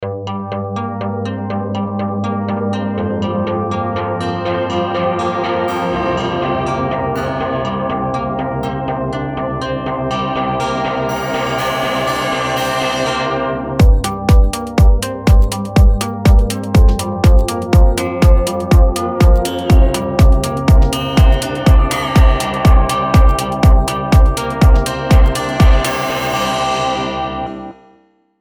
minimal material morph; sculpture; logic pro

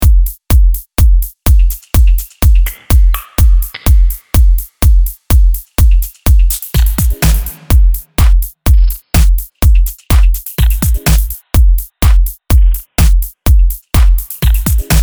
steely beats, ultrabeat; logic pro

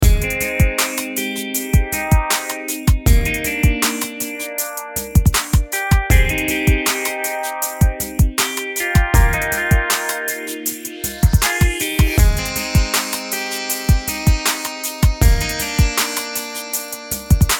ringshifter guitar; logic pro

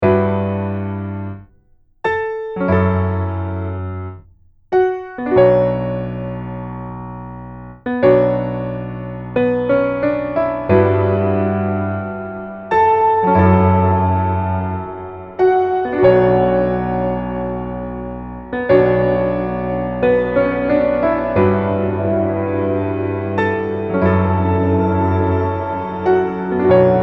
space designer piano; logic pro

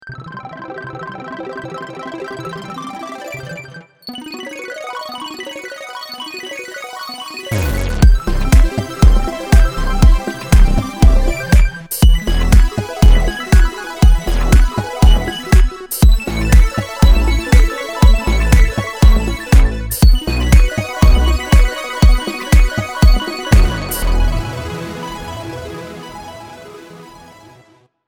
spacey, arpeggiator and midi; logic pro

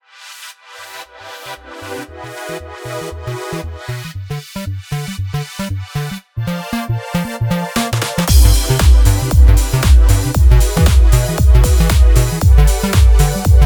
deephouse deepchords, vintage synths; logic pro

